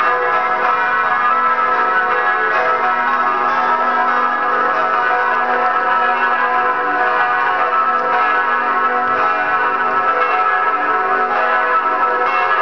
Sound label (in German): Kirchen, Kempten, St Lorenz